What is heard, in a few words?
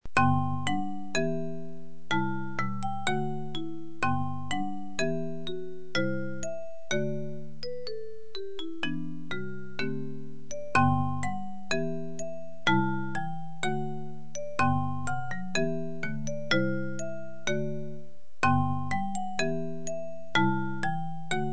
marimba